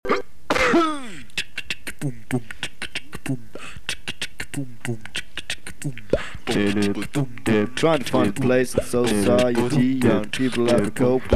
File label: acapella rap